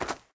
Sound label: snow run, step; blocks; Minecraft; Remastered